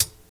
Raw Closed High Hat Sound F# Key, Royality free closed hi hat sample tuned to the F# note.; hat samples